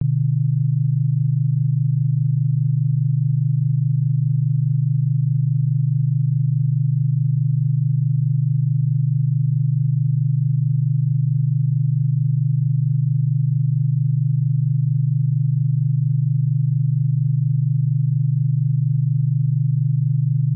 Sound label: high beta, binaural beats